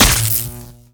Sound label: Plasmagun Scale, Damage